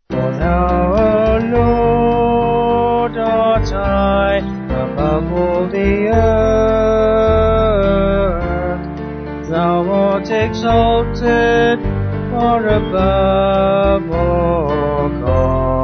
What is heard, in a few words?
Vocals Band